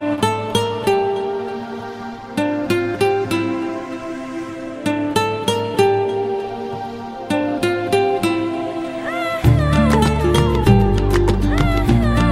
lovely tone

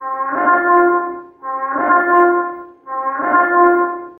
Field Horn

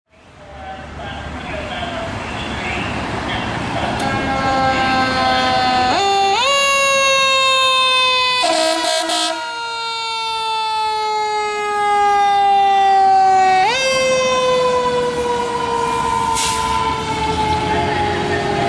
engine responding; pfd engine 55

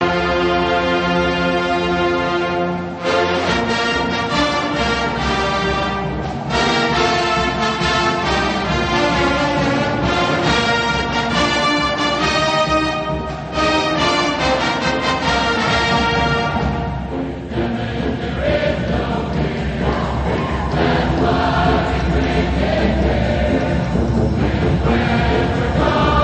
ringtones

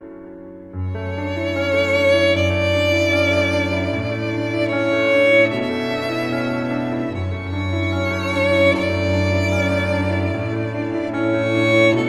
New Age Bells

